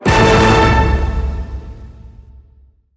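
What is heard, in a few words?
Hit Orchestra Triple A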